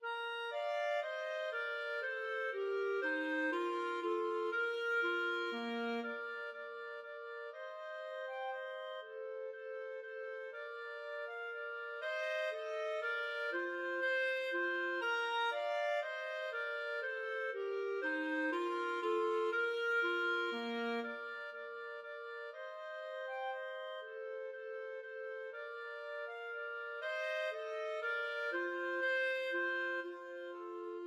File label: Menuett clarinet duet